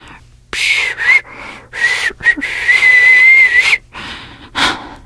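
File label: nervous whistle, teen girl; video game; source engine; left4dead2; survivor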